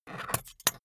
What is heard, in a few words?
scissors pickup